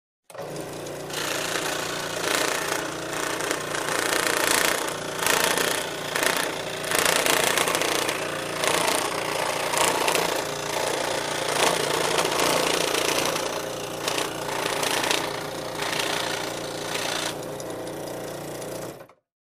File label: scrollsaw sawing